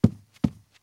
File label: run wood; Ben 10 - Hero Time Game; ThrillGames